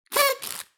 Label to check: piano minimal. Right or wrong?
Wrong